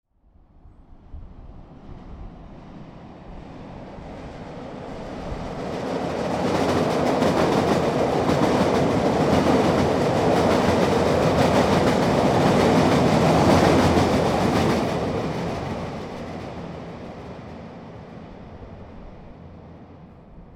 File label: Chicago Elevated Train By, Exterior; Elevated Train By , Fast , Medium Close Exterior Perspective From Underneath Tracks; Trains; Vehicles